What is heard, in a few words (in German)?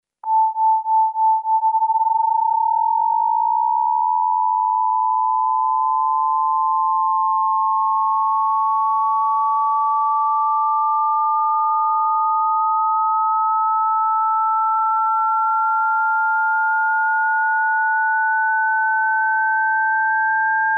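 Differenztöne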